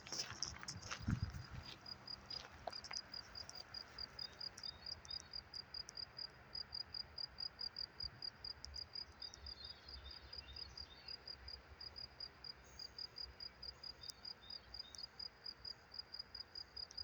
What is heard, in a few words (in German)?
Feldgrille; Zschorna Großteich